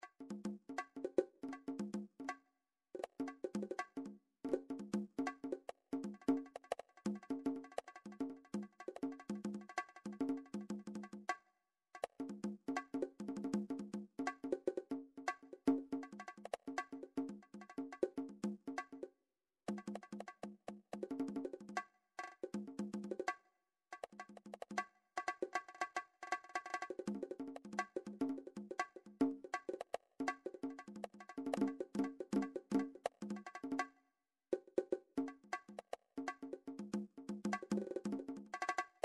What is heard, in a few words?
midi drums percussion; Midi Vol 4